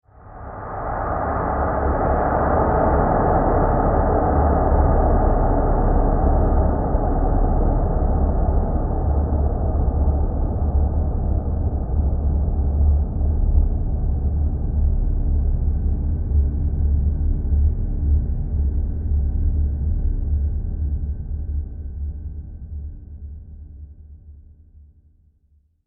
Horror Airy Reverb Swell Low Lfe; Production Element; Swells; Sound Design

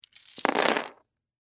Game Pieces, Drop, On, Board; Sports; Plastic; Monopoly, Hotels; Board Games; BlastWave

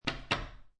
Playing Cards Tap Deck Of Cards On Wood Table; Lynne Music

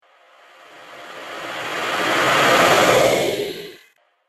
Pyramid Phantom, ghost sound effects; Phantom Sound Effect - Surreal Ghostly Apparitions; Andrew Fleming

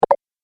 Game Unlock Door; video game; Video Game Sound Effects

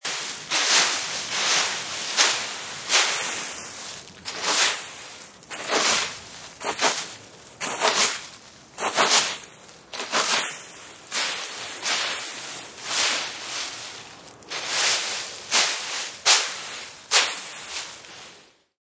Rkng Lvs, Raking leaves in yard.; Yard Tools

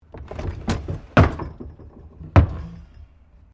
Drawer Utility Large Full Close; Various domestic drawers; Household

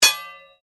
Blade Heavy Moving Impact Ring, Sword; Foley sounds for melee weapons; Swords (Sound Effects:Combat)

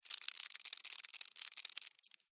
Cockroach, Walk, Insect, Bug; Sound Effects:Animals:Insects; Cockroaches; Blastwave

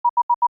Computer Beep: Indicating Activity; Beeps; Multimedia

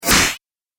Space Door - Slam Swoosh; Whoosh Sound Effects

